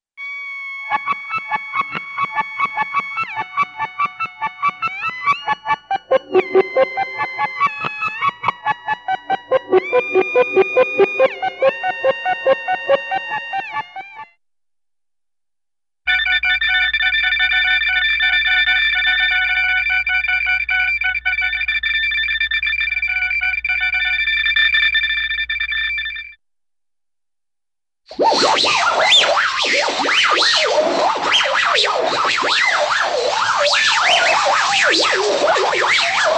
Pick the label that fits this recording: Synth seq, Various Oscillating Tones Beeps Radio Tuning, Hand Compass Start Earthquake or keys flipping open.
Various Oscillating Tones Beeps Radio Tuning